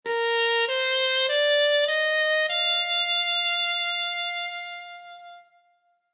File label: Horn Mute